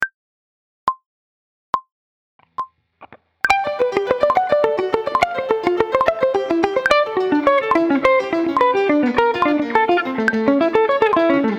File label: alternate picking, guitar